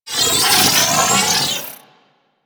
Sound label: Missile Swarm Proj By; Warframe; Corpus; Corpus Railjack; Spec Ops Arachnoid